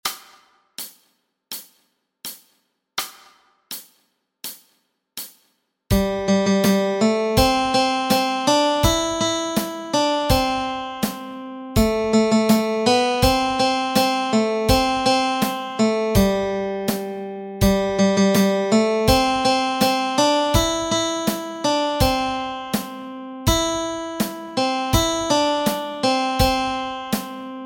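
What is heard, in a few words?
melody, Easy Guitar and Ukulele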